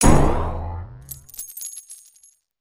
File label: loot drop; foley